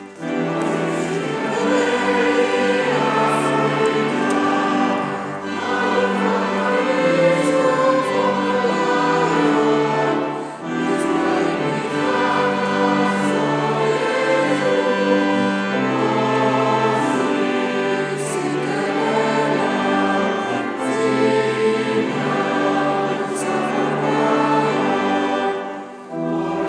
south african national anthem sung by choir and congregation; st martin in the fields chur